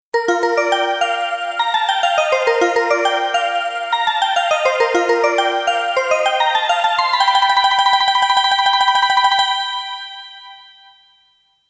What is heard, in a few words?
Water Crown